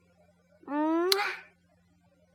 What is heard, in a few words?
Kiss